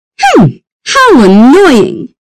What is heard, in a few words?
fish; fishDeathEffect